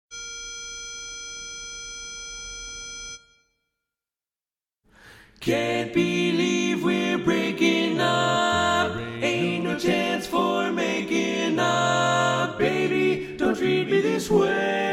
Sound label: Tenor, Learning Tracks, Barberpole Cat